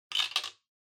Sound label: Bingo Machine Drop